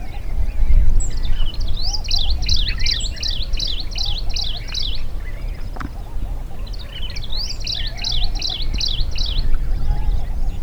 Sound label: Song of a Rufous fronted Prinia, Maharashtra